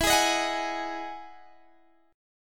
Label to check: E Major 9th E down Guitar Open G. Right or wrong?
Right